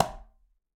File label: Quinto C Slap Mute, Conga; Latin Percussion; Drums; Drum Hits; Ableton; Factory Packs